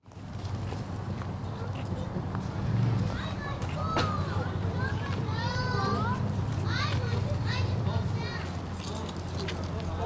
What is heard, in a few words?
park, ambience